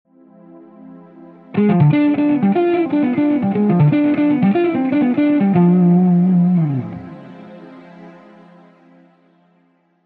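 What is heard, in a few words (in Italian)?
Esercizio 3, scala maggiore armonica di Do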